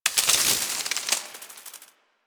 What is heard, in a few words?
Creature Scurry; Ambience; One Offs; Warframe; Quests; The New War; Drifter Camp